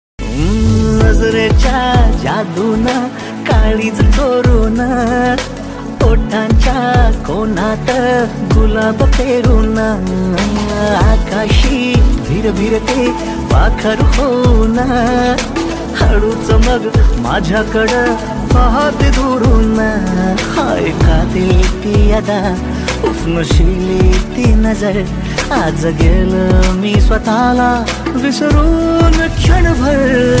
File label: Marathi Ringtone